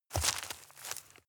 step leaves heavy boots

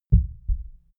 heart beat double quiet low

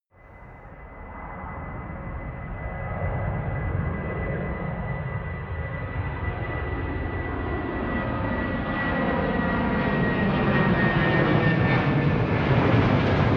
plane large flyby land, Verkehrsflugzeug; flugzeuge verkehrsflugzeuge; verkehr transport

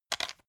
pepper mill fast; soundpool

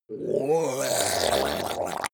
zombie grunt choke male long; kreaturen

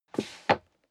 step leather shoes wood creak scuff; schritte

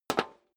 step high heels metal dry single stair down; schritte

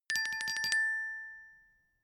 glockenspiel tremolo low octave short; percussion

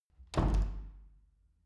wood door old reverberant soft

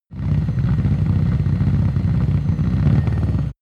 car subaru impreza idle

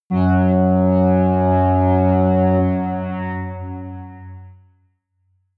synth tremble bass high

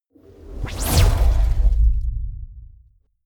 sci-fi plasma gun fire swoosh; pistolen gewehre

